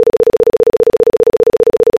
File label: telephone; Phone Ring